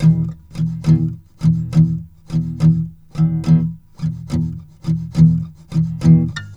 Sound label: AC F 2 L, guitars; Spectrasonics; Hans Zimmer Guitars VOL-1